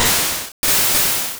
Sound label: Leech Seed; Pokemon; Gen 1; attack moves; RBY